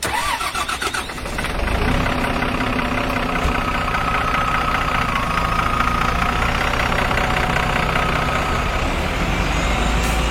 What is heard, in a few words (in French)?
démarrage de bus